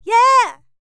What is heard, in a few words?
LICKS, Vocal R&B Dance; LT DANCE